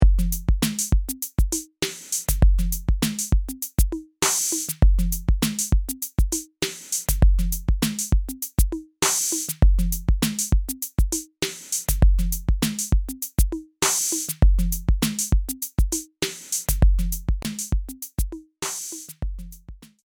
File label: Loop Sample